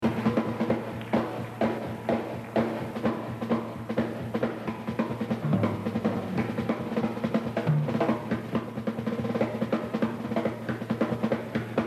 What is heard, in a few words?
Solo; The Evolution of Jazz Drumming (Gottlieb)